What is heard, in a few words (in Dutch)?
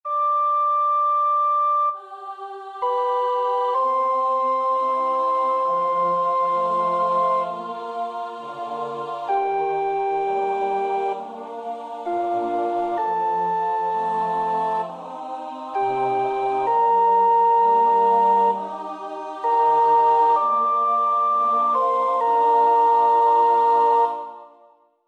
sopraan, koorpartij oefening; Schütz (Heinrich); Matthäus Passion SWV 479